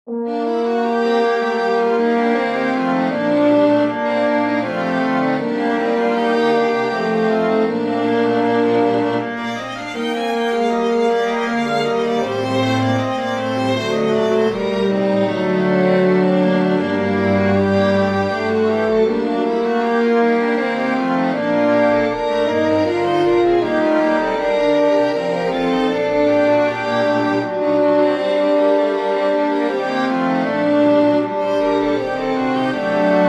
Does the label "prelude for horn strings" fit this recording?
Yes